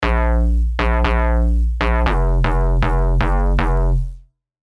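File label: Kick It, bass; tropical pops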